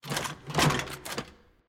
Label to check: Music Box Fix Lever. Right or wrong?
Right